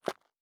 Dirt Run Surface, Footsteps; Survival Game Sounds - Lite Edition

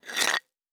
Rock Moving; Survival Game Sounds - Lite Edition; Environment

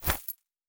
Coin Bag; Survival Game Sounds - Lite Edition; Objects and Items

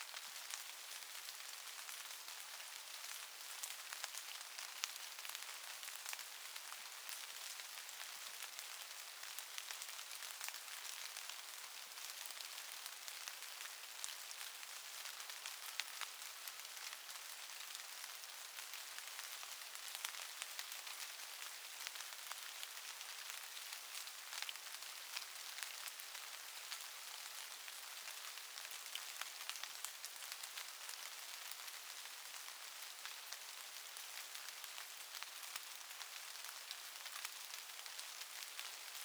Rain Light Loop; Survival Game Sounds - Lite Edition; Environment

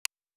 UI Simple, Interface; Survival Game Sounds - Lite Edition

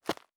Dirt Run Surface, Footsteps

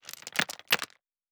Rock Small Debris; Survival Game Sounds - Lite Edition; Environment

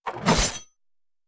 Trap

